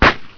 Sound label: CRACKER, 爆炸; 爆炸系列声音素材